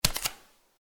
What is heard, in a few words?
Footsteps Barefoot One